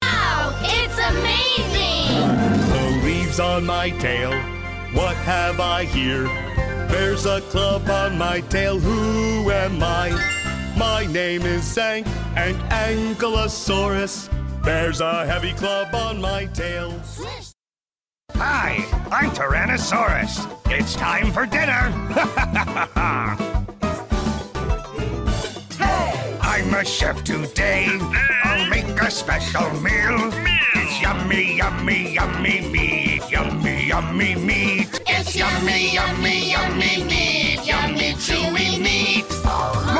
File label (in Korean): 영어남 동요